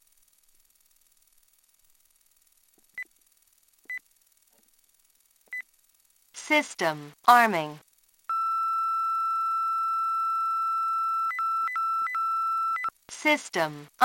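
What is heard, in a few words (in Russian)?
охранная сигнализация включается а затем отключается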